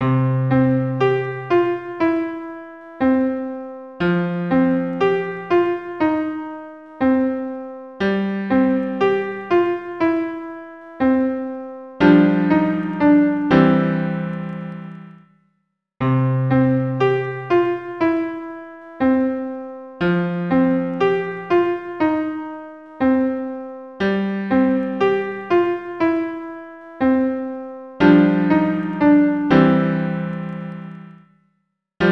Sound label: 120 bpm